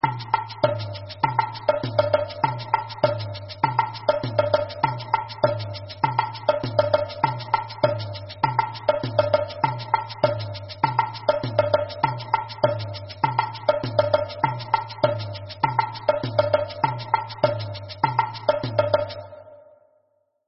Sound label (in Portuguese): samba reggae agogo